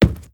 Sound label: Soft Wood Run